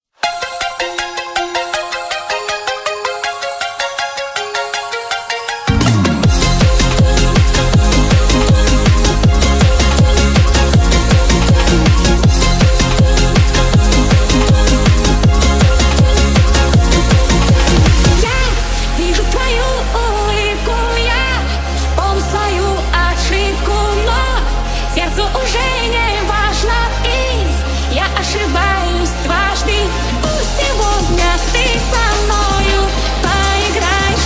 hardstyle speed up remix

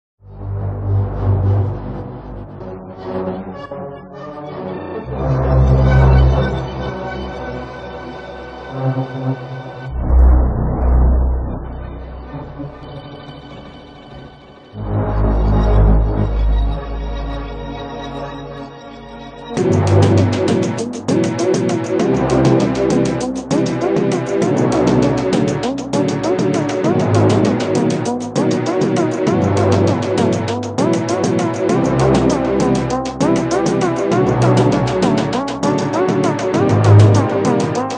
slowed

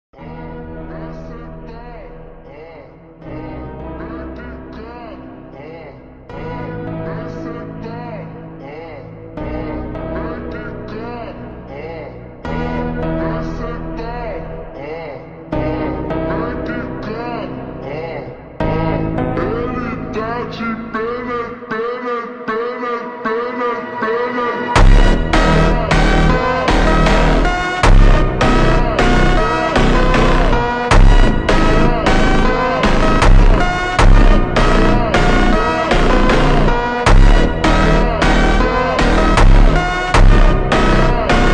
brazilian phonk; ULTRA SLOWED + REVERB

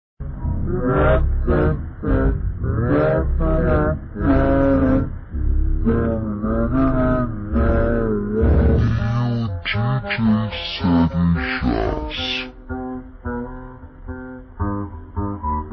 funk extended ultra slowed

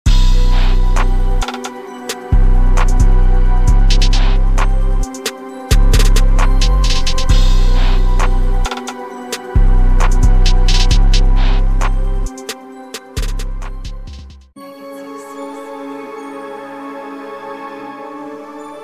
stalker plugg dark plugg type beat

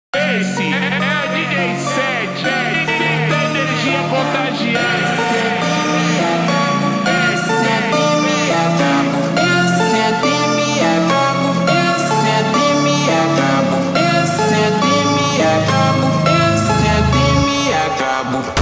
funk slowed reverb

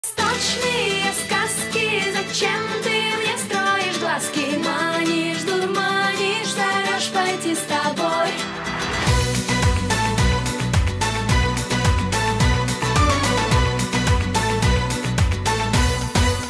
trap remix

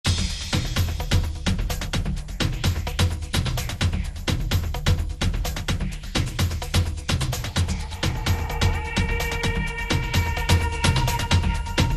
remix

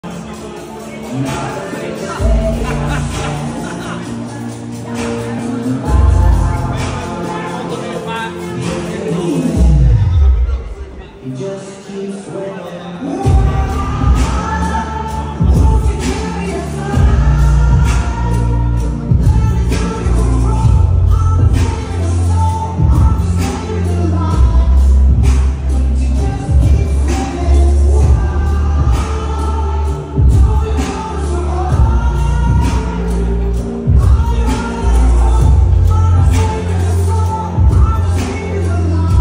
bachata remix

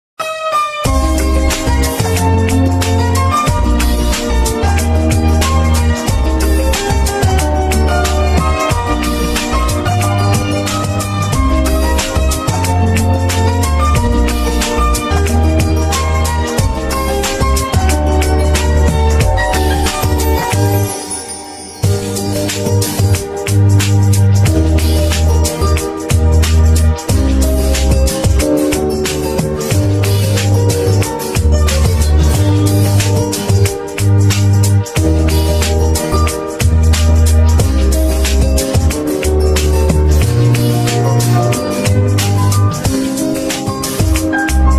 karaoke

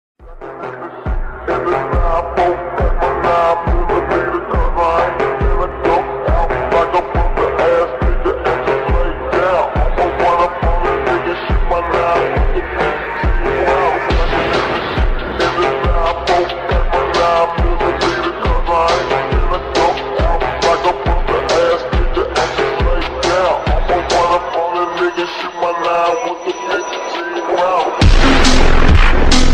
slowed reverb

